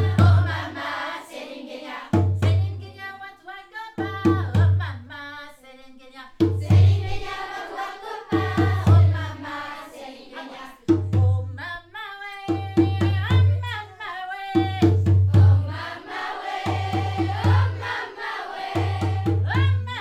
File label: Singing in Fontevraud